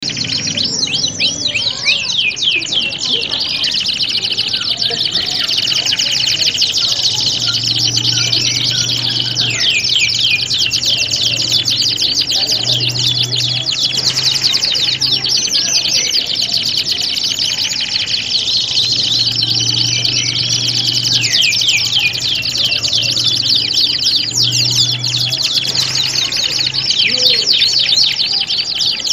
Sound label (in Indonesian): simulasi lomba kenari standar